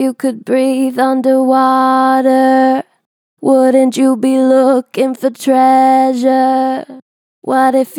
Vib What if you could breathe, Vocals, Natural Vibrato Strong; Harmor Vocals